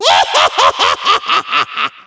laugh, spooky pumpkin

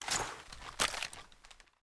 Cloth

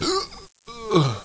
die

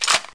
bolt back, weapons

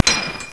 pling; weapons

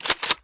mag7 pump; BalasToxicas

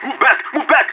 blow

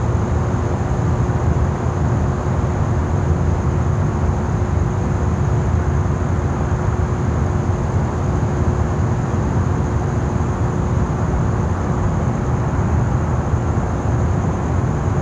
Distant city

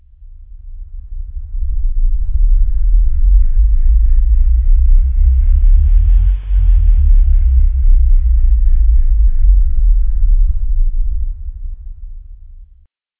power up down

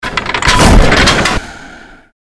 duck

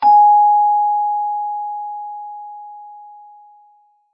chime

